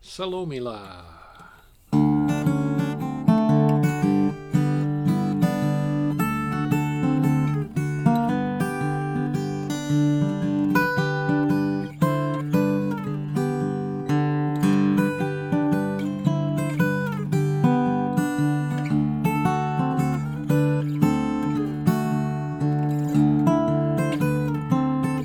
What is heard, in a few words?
XY Zoom